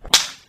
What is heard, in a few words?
rope breakout, handcuffs; Items